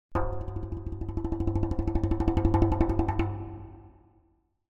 Drums Percussion Danger - Fast Drumming On A Thin Metal Percussion; Hollywood Edge; sound designer's toolkit v2